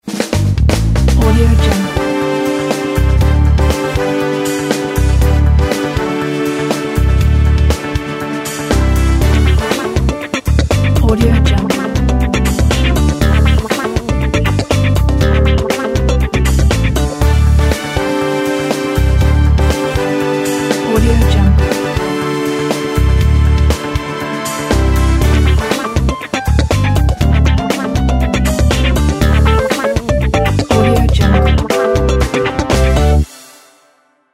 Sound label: tv show opener